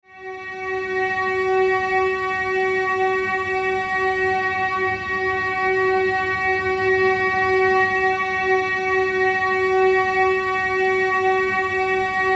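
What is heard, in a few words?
Medium F sharp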